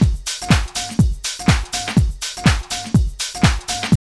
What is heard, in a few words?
house loop